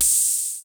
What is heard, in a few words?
open hat; electronic; gibber